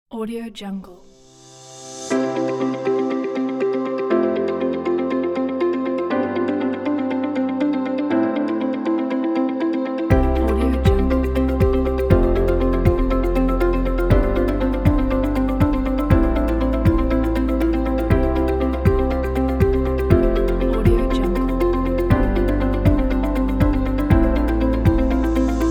ambient corporate

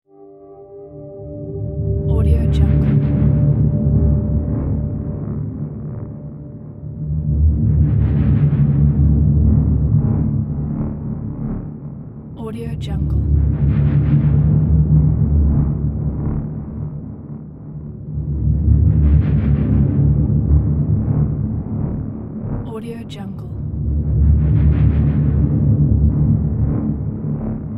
scifi horror ambient drone

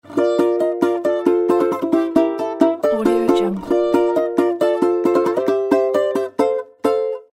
happy russian balalaika logo

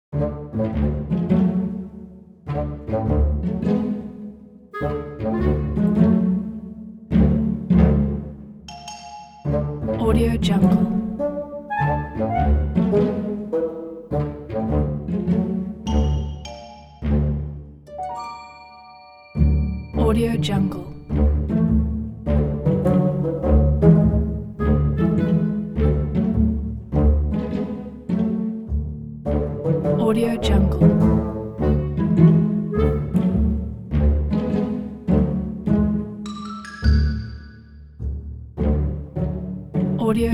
cartoon comedy adventure; ilovemedia-es

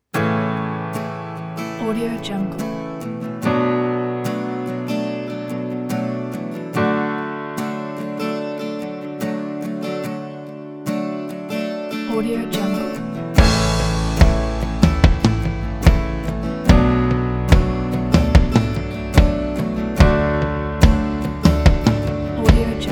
lite indie inspiring positive rock

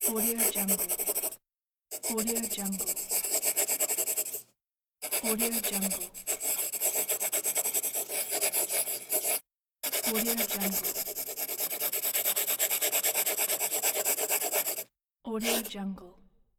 pencil writing

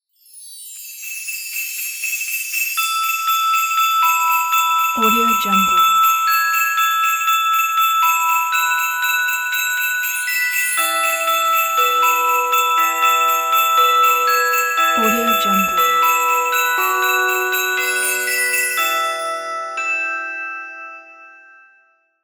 christmas sleigh bells ident; stylishproject

